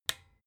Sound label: desk lamp on